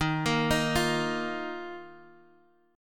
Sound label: Eb Suspended 2nd, Guitar Half Step Down